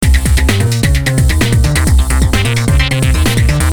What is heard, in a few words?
Loop